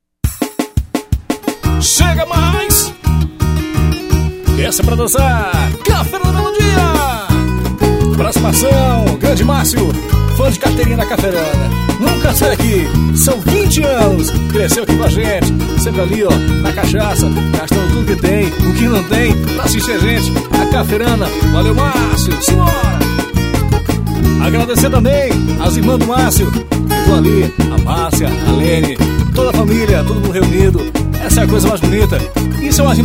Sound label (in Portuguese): techno brega de aparelhagem em ritmo calypso